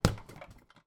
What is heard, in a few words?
soft play padded hanging punch bag punch